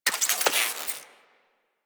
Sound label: Drone Switch Out